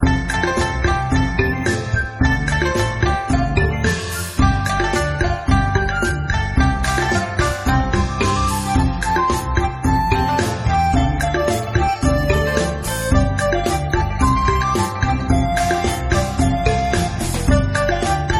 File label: bgm